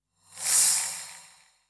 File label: Simple, transition